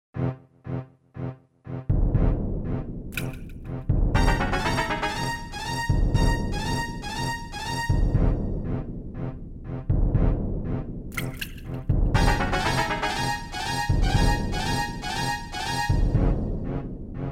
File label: Dungeon BGM; zelda the music